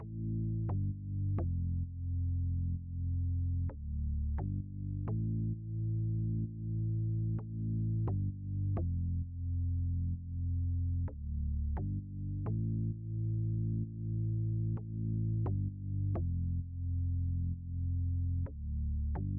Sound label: 130 BPM A Min Bass; Trap; Melody Loops; Loop Stems; Cymatics; Nightmares; Champ